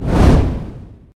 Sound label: fire